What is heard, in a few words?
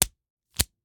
Slap bracelet, smack, slap, whip, felt, plastic, hit, hold, continue; Eiravaein Works; Vaeyan IV